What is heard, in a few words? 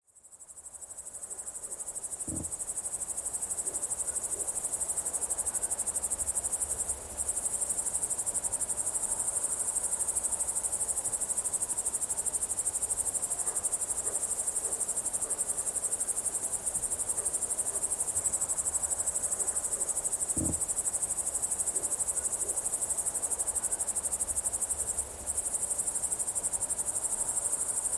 cricket and dog; Chillout - Downtempo music